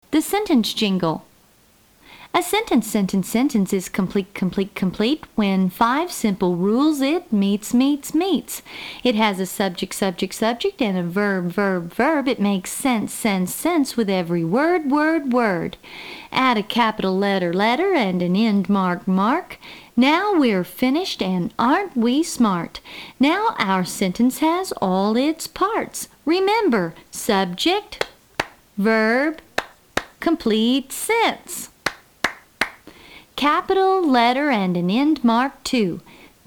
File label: sentence jingle